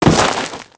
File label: body fallen; shipcharge; Pirates Of The Caribbean